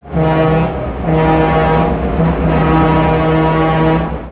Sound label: horn